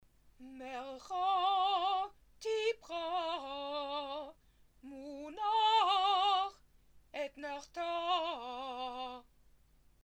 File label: etnachta clause; Etnahtah Clause; Torah